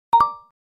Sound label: crystal clear